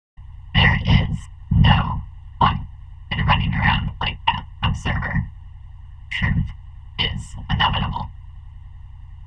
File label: warning